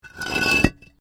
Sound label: Brick Medium Hollow Move